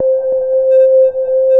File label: FILTER RINGS, TECHNO; Pro-Rec - Synthsonic; Z1 TECHNO